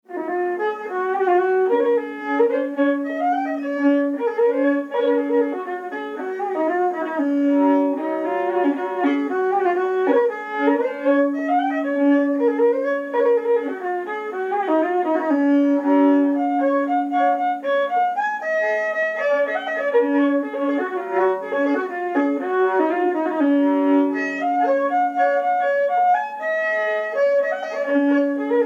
polka; The Five Servants

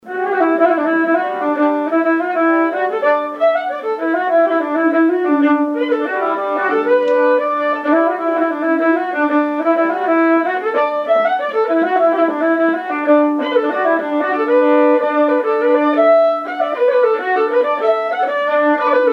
jig; The rolling waves

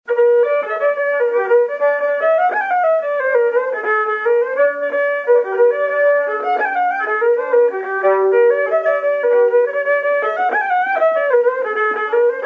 jig; A visit to Ireland

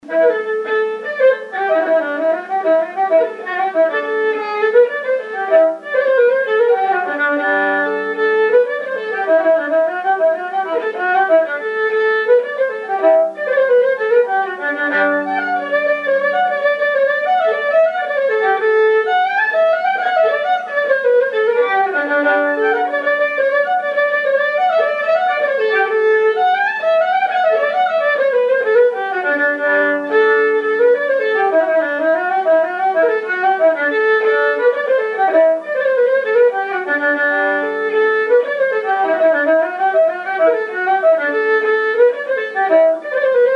jig; Connie the soldier

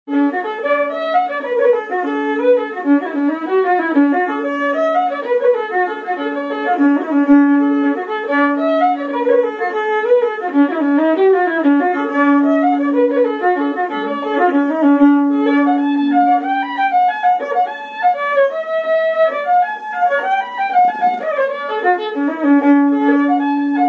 jig; Jerrys beaver hat

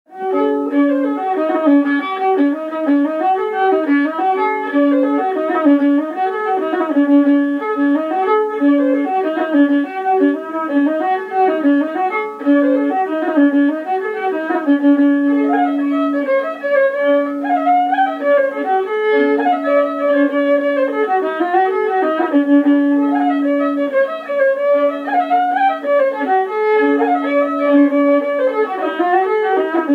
jig; Connie the soldier

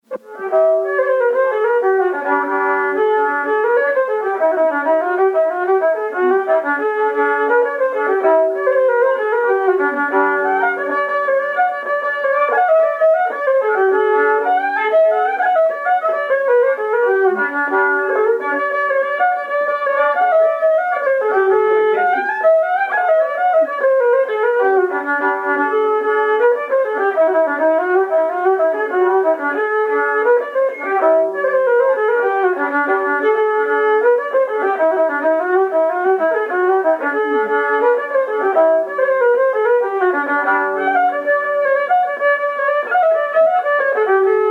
jig; Connie the soldier

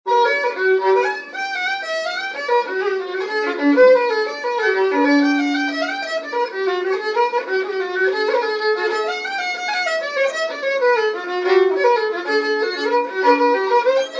jig; The Fishermans lilt

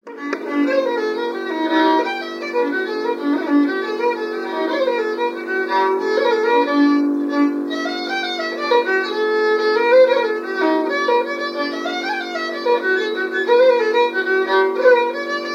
jig; Tell her I am

